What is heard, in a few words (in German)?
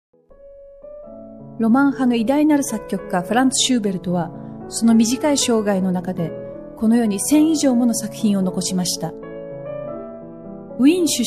japanisch